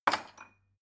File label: glass shelve wood